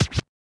Scratch; SSO Misc

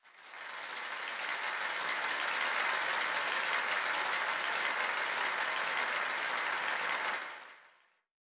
Crowd; SSO Misc

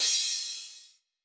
Crash; SSO Crashes

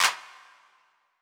Clp Open, Claps; SSO Claps

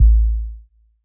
808 Drum; SSO 808 Drums; SOUNDZ N KITZ; SSO Kit vol 2

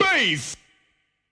Chant; SSO Chants

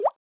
Bubble; SSO Misc

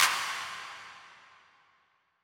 Clp Rev, Claps; SSO Claps

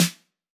Snare Open; SSO Snares

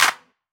Clap Hand, Claps; SSO Claps

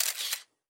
camera; SSO Misc

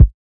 Kick; SSO Kicks

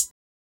Hat Closed; SSO Hats

